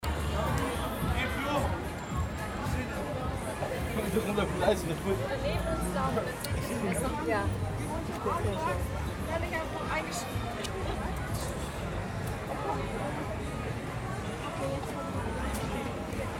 Rummel Menschen Gemurmel

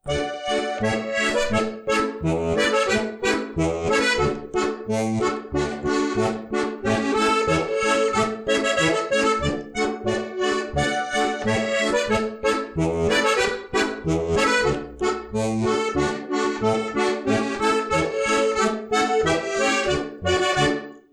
Huckepack Loop

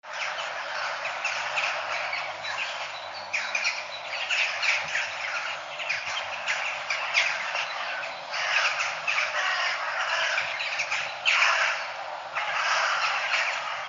Kraehen Morgendaemmerung

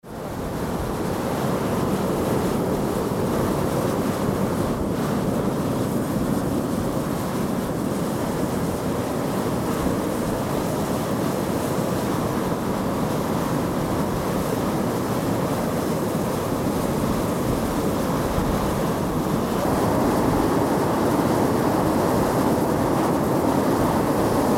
Myvatn Rauchender Dampfkegel

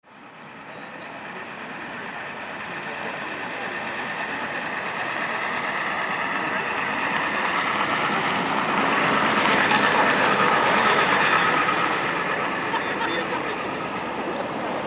Hafen Husavik Moewen Leute Fahrzeuge

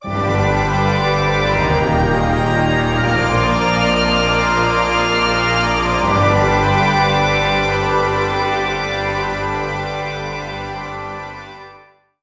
Orchester Episch A

